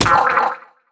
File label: Hurt